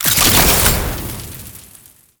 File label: Alchemy Grenade Pickup Fire; Entrati